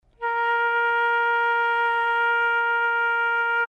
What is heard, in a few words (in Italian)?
Flauto Sib